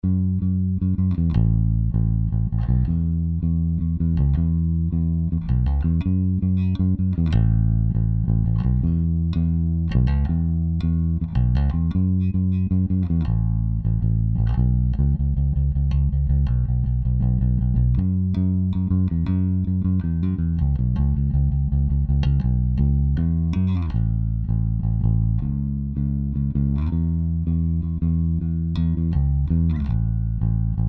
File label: bass; Black Lion Audio B172A